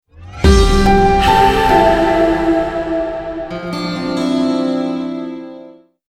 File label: Mnemonic; AT&T; GSAC